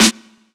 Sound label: Heavy Snare; Zero-G Sound Sense Grime Scene; GS Kits